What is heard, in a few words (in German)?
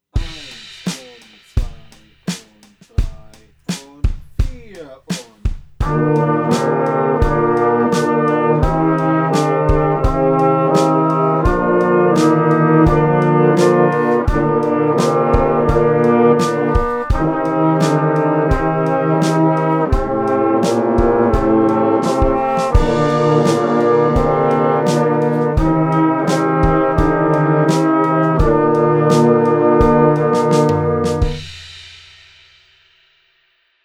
übung Tutti